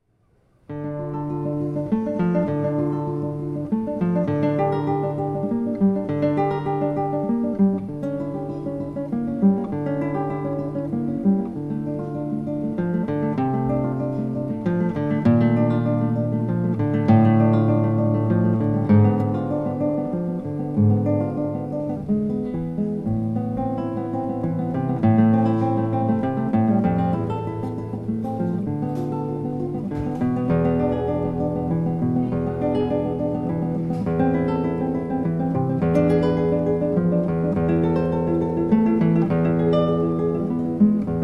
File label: Prelude in d minor BWV 999; Johann Sebastian Bach